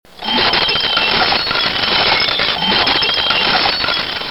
Jackpot

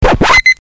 Scratch bip

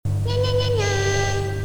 Nanana, Cartoon; bruitage

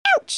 Ouch, Cartoon; bruitage

